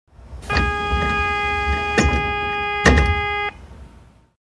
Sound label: door open